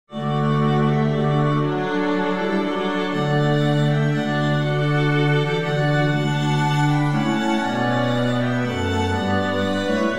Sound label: Trumpet Tune in C